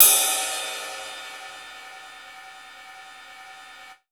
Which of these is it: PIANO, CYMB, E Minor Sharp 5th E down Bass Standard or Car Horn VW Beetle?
CYMB